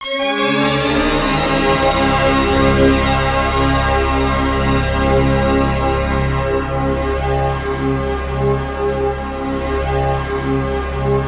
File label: GLIDE; Optical Media International; Sonic Images Library; SI FX Vol 9